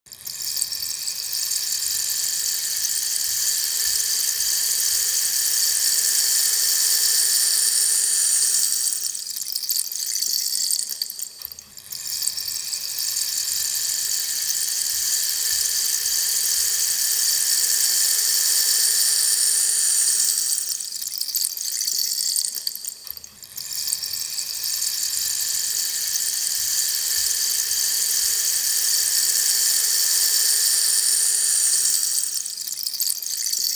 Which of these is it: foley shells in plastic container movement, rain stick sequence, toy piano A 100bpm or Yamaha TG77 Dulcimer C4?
rain stick sequence